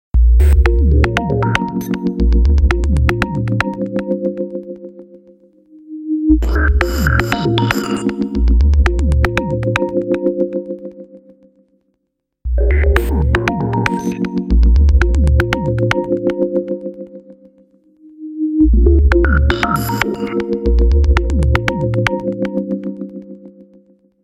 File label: Ice Tone; Nokia; Nokia Tones; Windows Phone apps